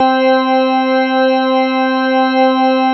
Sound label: ROCK ORG; Synclavier Sampler Library